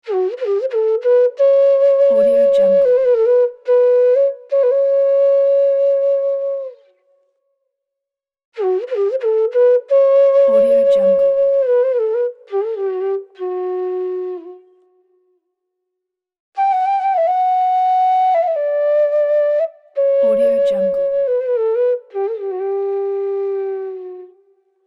mysterious indian flute

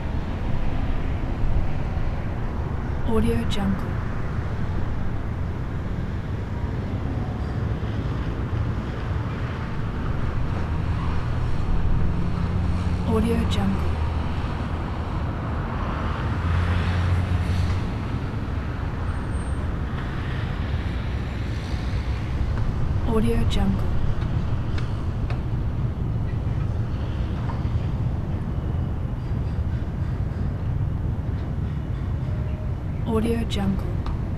distant city traffic; prosoundfx

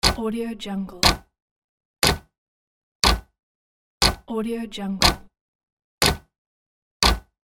clock

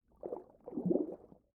underwater movement; prosoundfx

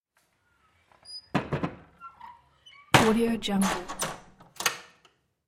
household shutters window wood close

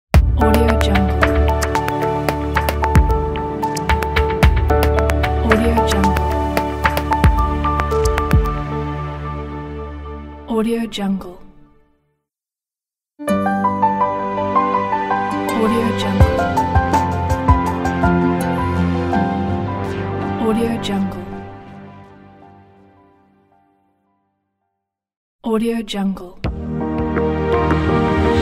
opening logo pack

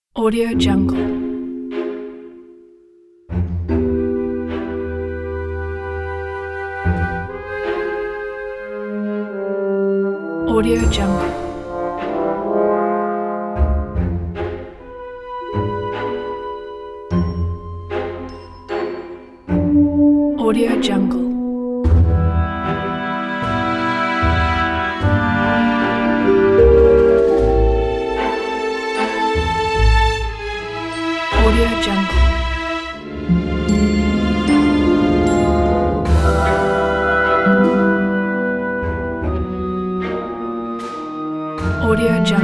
magic mystic cinematic

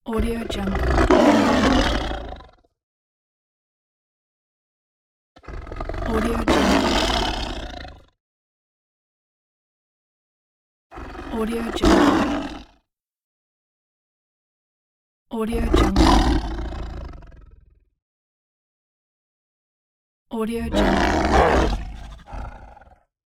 tiger

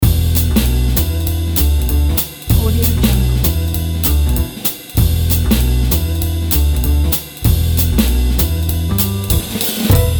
the jazz

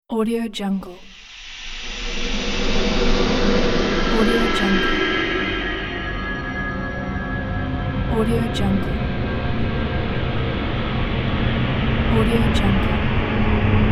fantasy to horror transition

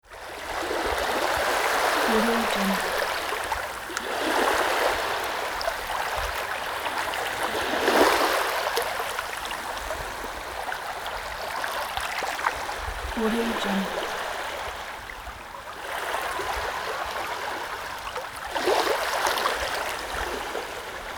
sea

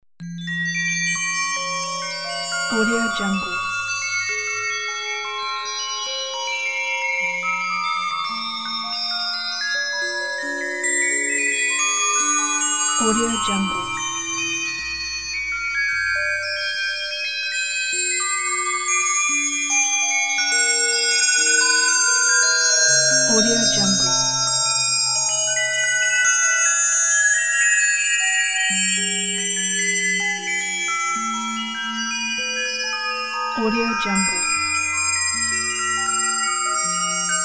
bells pack; soundfoodsfx

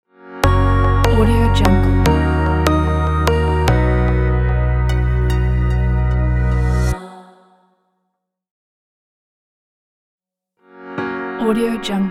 the logo

